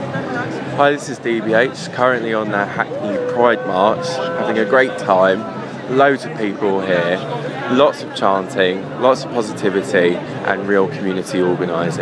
hackney pride march

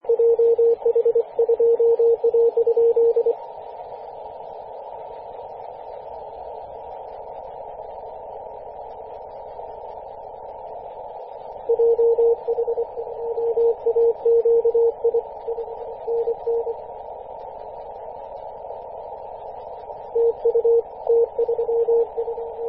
10mhz CW

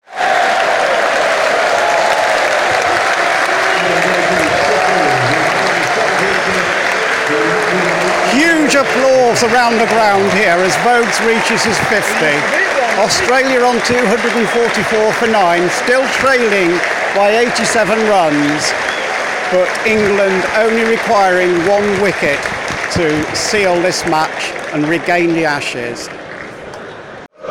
huge applause

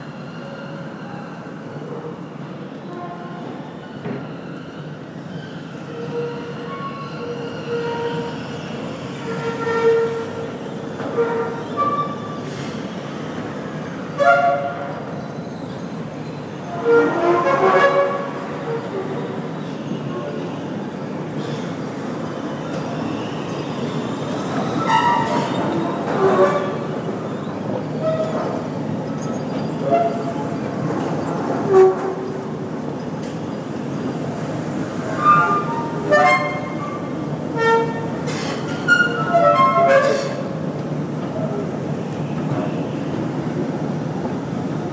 tunnel tunes passing by

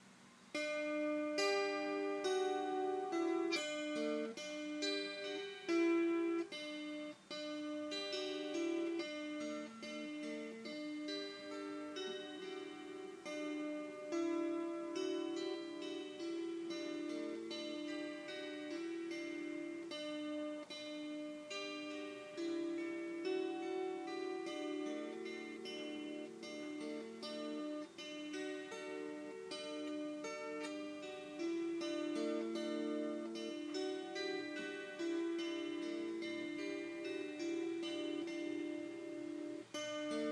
impromptu construction sounds

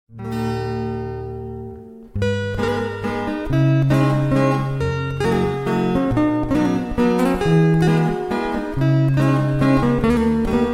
intrumental cover

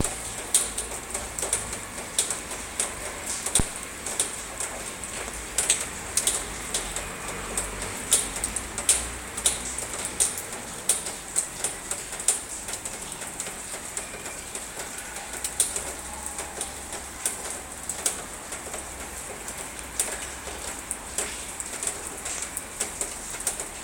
rain

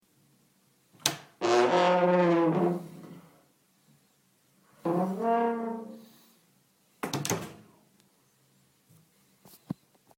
toilet door tuba

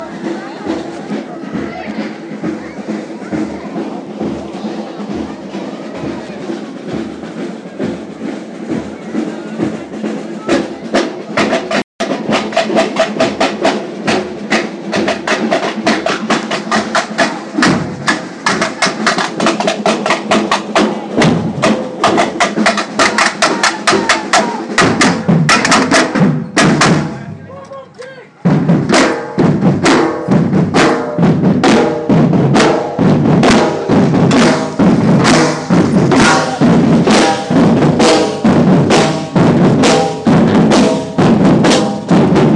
sounds from the hedben bridge handmade parade

